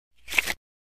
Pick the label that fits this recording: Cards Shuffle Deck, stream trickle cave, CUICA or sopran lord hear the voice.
Cards Shuffle Deck